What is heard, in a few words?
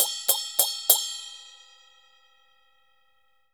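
CHINA SM16 L, China cymbal; Spectrasonics - Heart of Asia CD2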